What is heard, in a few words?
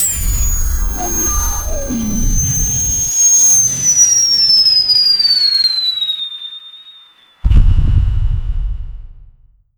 sound for a, weapon